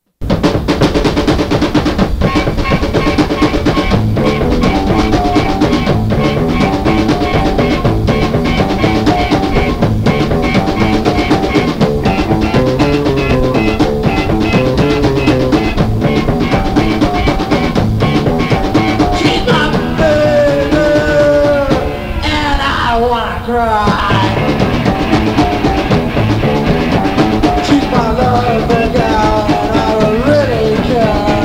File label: Train Song